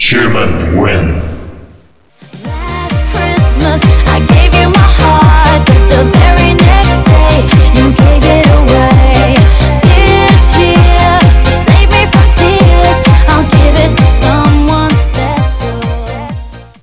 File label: human win; zombie plague